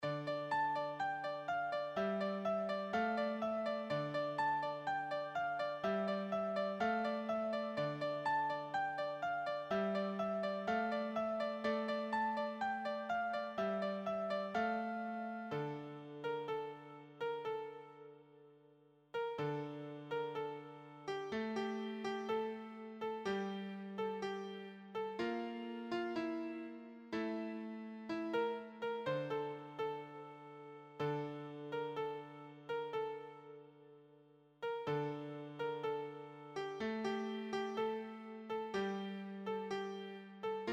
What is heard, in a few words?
beginner solo, piano